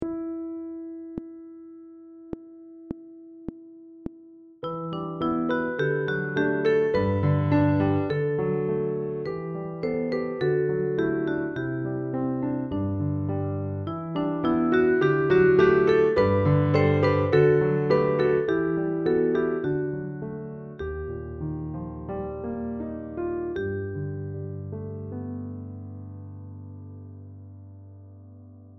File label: Piano Vocaline 8va